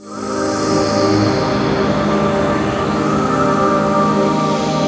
Seatown Pad, Jungle; M8

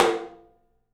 open tom; 3D Sound; 3D Drums

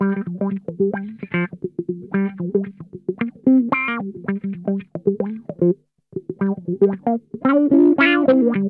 SKIN DEEP, Funk Guitar; 90 s Sample CDs; Zero G

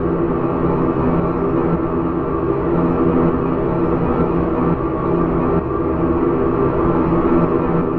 Drone Ambient; Drums & Drones

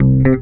CLAV BEL; USB Soundscan vol.32; Roots Disco; AKAI

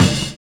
CRASHING; Univers Sons

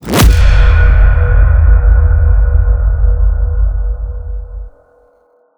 impact data; Virtual Riot; Impacts

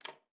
KLPB A#3, ALTOSAX; Xsample VOL-2

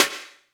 Snares, Elektron MachineDrum SPS1 MKII

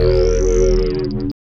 Windage, Bass; Sonic Foundry (Sony Creative Software); Synchro Funk

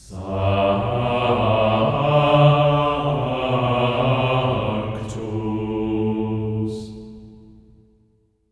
SANCTU G3; Spectrasonics; Symphony of Voices; GR SANCTU

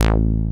BASEMENT BASS; Masterbits; Dance Trax

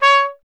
Trumpet C#1, Solo Instruments; Miroslav Vitous

